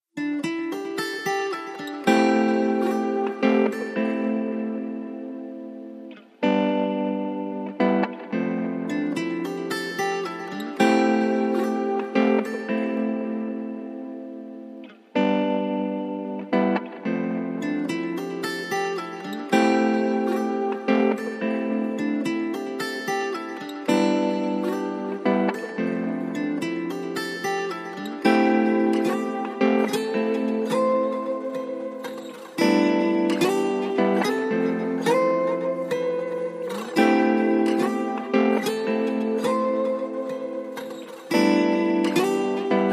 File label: Guitary Mix